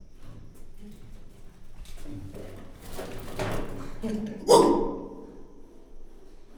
bot rácson kidugó megszólaló majom, csimpánz; gyori zoo 2014

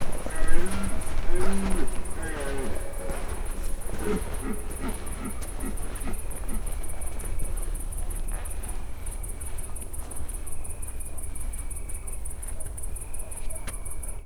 lépések, gímszarvas; termeszetben

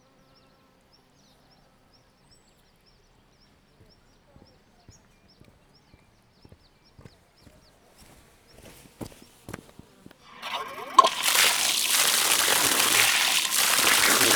megérkezés után arcmosás továbbhaladás, artézi kút; Szögliget

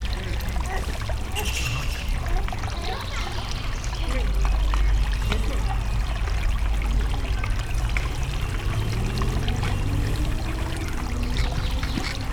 a felvetel elejen a hatterbe beleolvadva, japanmakako; szegedi zoo 2011

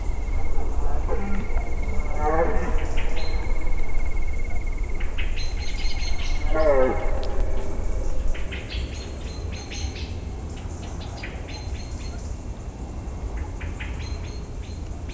madar, gimszarvas; termeszetben

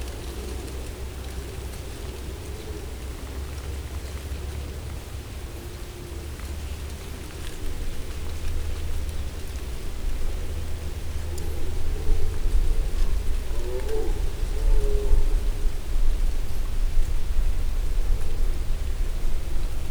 falevelek egyre távolodó bőgő bikák, gímszarvas

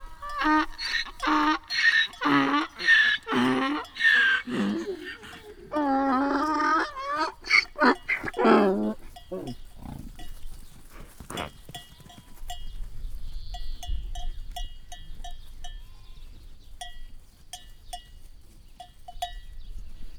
ketto szamar; laszi tanya

